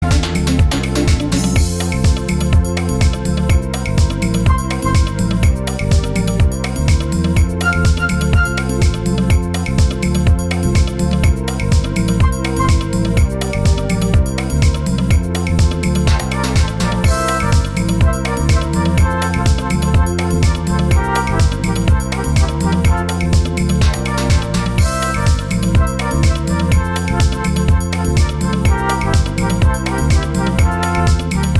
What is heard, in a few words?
samba